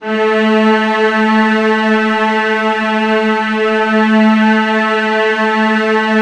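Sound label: VIOLAS B3; ILIO; Synclavier Strings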